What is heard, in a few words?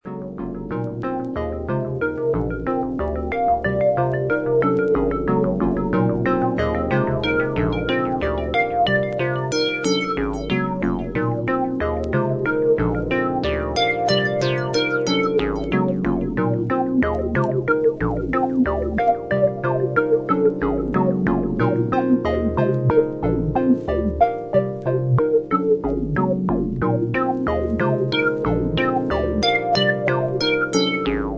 AMBIENT EXPERIMENTAL